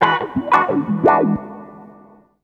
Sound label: Wah Wah; Drum & Bass